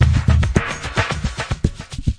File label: 110 thick echoes, drum loops and breaks; 101 to 110 bpm